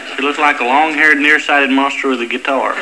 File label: guitar; Monster